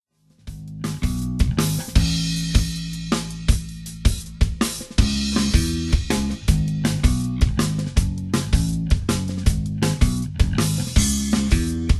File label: Sample Kit & Bass mix